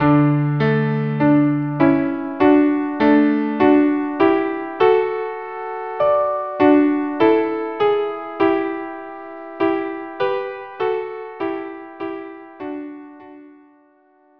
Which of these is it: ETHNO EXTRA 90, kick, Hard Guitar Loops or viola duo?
viola duo